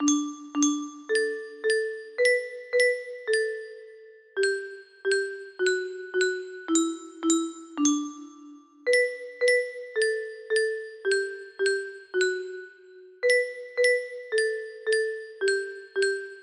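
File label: music box melody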